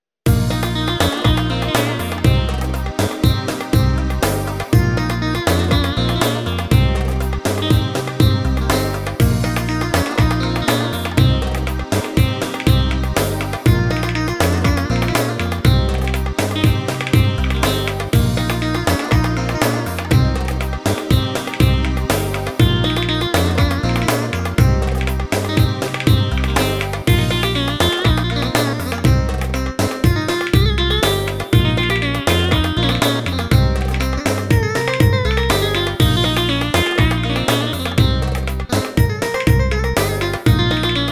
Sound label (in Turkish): usak